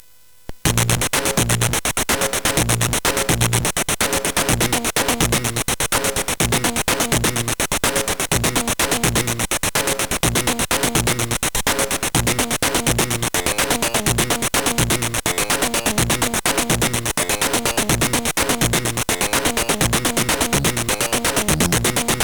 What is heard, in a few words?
Bassamp, CSG8580R5; hvsc